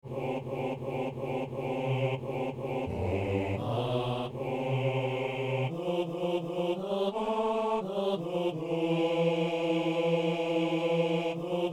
basse

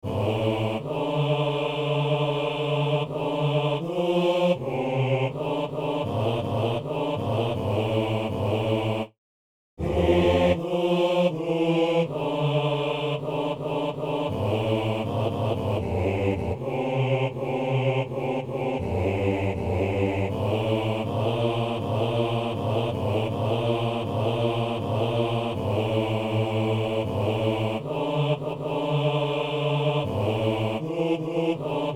basse

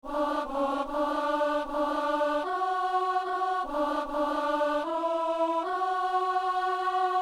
psaume, alto

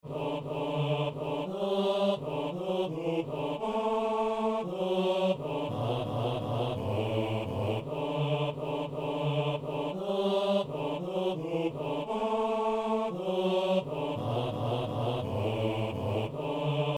basse; Messe de Saint-Boniface - Alleluia

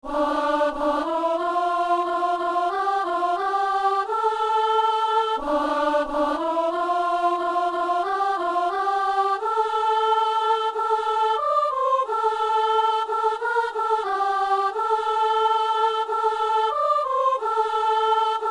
messe acclamation soprano; Messe inaugurale

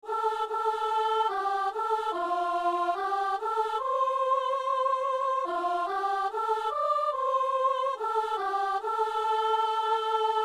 psaume, soprano; Psaume 32 (33); Messe du 17 janvier à Saint-Denis

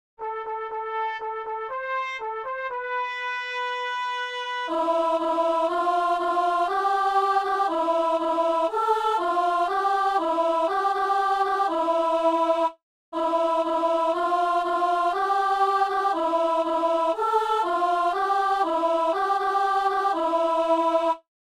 chantre et alto; Messe de Saint-Paul - Alleluia

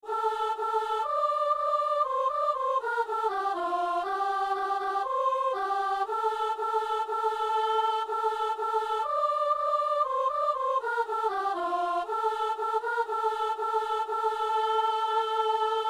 messe sanctus soprano; messe de saint jean

